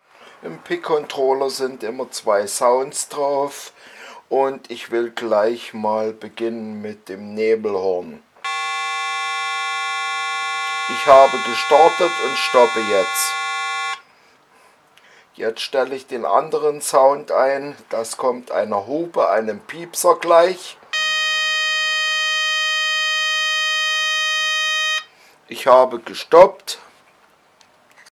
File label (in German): Nebelhorn Hupe